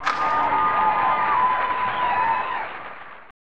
Cheering; bulls vs. blazers; snes

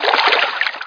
SWIM; MG FRANKLIN

